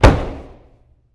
Boxing Ring Bodyfall

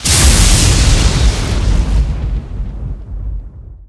Explo Large Electricity; Destruction

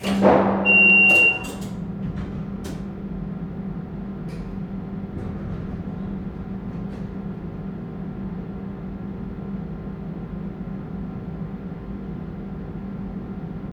tram; best service Blue Box

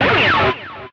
Pickup Guitar Scrape; SFX Kit; Pickups

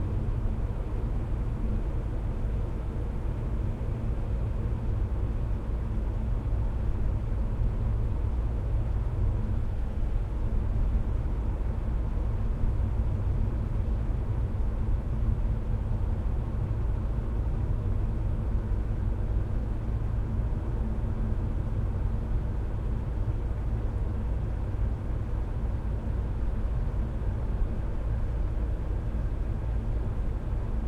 engine self loop; Apotheorasis

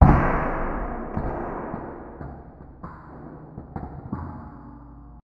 SMASH Pot Developer, Cinematic Metal Impacts

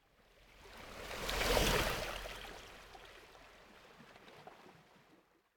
Ocean Gentle Water Lap On The Coast Surf; Hollywood Edge; sound designer's toolkit v2

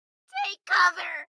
town human road; Blind Drive

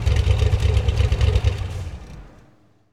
Corvette Idle Off; Automobiles

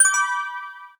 Pickup Gem Bells; SFX Kit; Pickups

